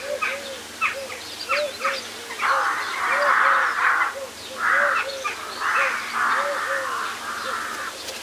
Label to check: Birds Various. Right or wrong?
Right